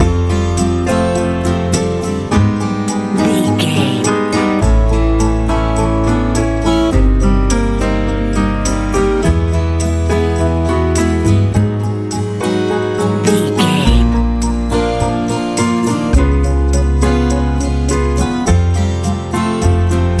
colorful warm lite pop

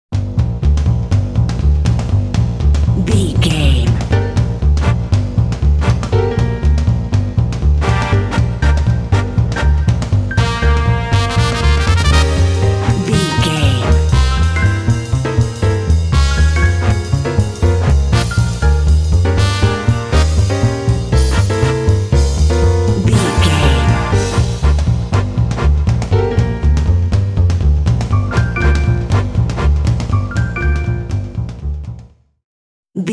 swingin get there fast

